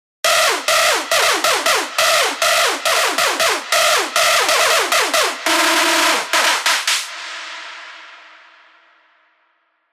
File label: screech uptempo; revealed spire mainstage drops